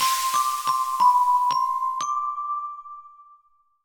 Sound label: Retry Jingle; Friday Night Funkin Funky Grieving Tuesday